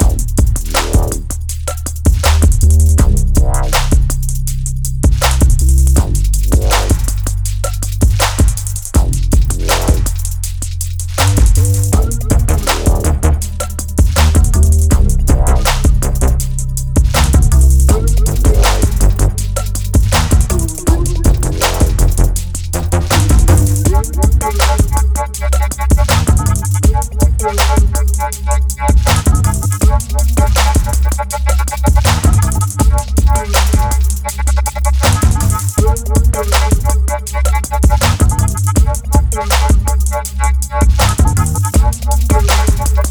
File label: Bass And Trap